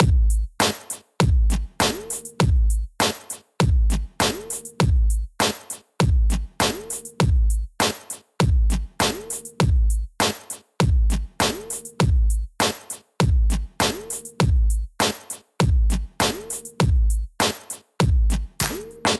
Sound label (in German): beat mit kompression